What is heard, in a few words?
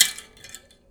Finger, Prepared Kit; Battery 3 Sample Kits